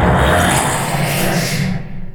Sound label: shadow poison cast; weapons; dota; shadow demon